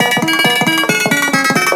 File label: Elevator Music; Jurassic Park